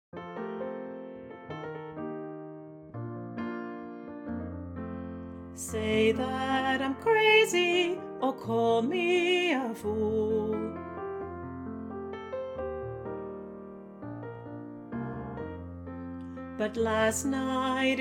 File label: ALTO; Learning Track; the peoples show choir